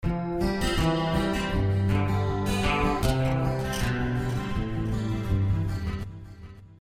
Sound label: Guitar Sms